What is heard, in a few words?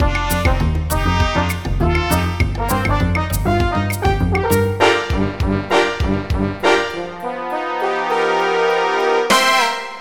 salsa; RIFF MIDI Music